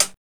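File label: HH, hi-hat; X-Static Goldmine 1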